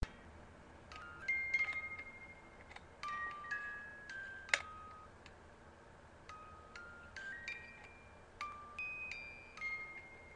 music box song

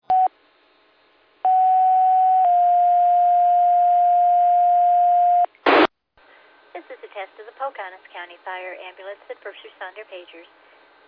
fire page received; plover

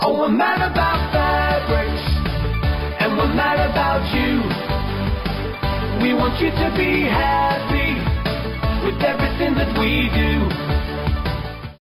jingle

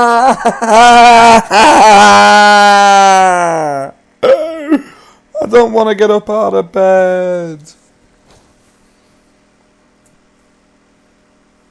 booing

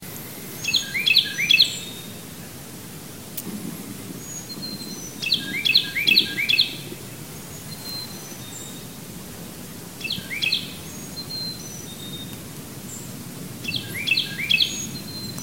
carolina wren

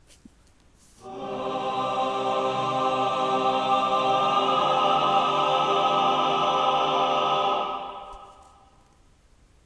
choir hitting the right note; warhouse

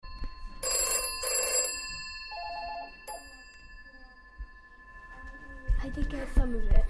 telephone, bell, indoor; Fermynwoods

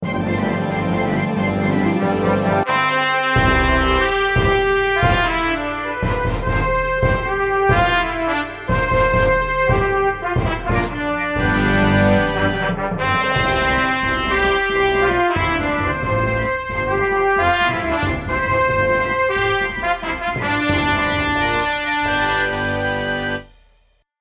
star wars with backing track

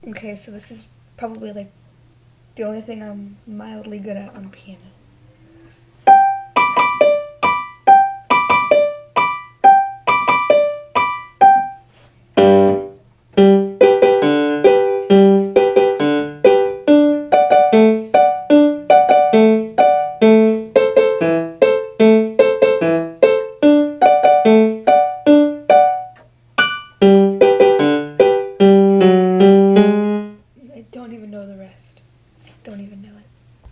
I fail at piano

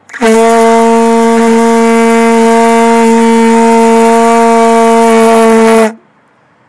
vuvuzela

